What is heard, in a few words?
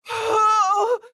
AHurt; Morty